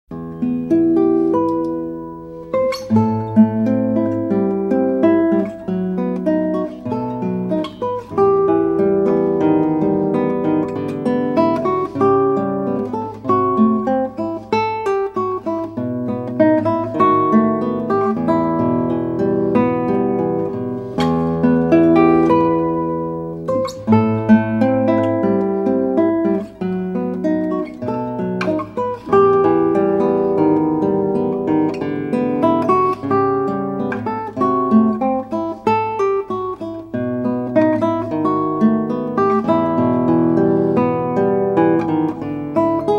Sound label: classical guitar